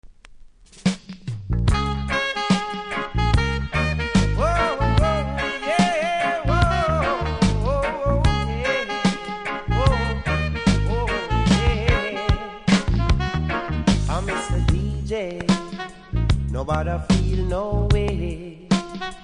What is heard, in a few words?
Sweet Reggae Music; REGGAE 80'S